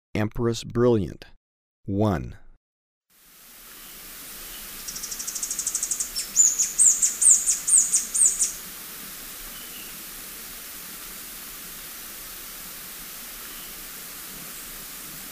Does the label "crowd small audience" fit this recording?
No